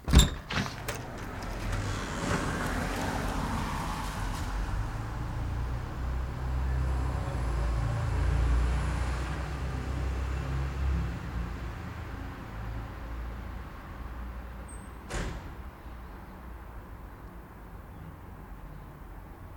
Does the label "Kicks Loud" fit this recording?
No